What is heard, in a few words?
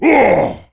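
Weakened Ogre Attack; Seer; GamigoNA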